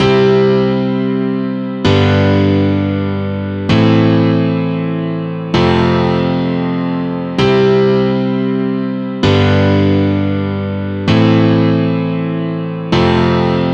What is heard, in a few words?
chord